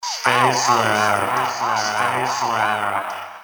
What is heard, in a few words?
distorted